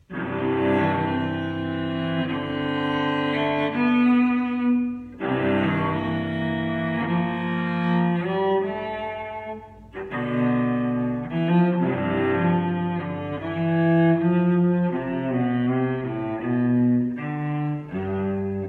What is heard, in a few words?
cello Sound Sample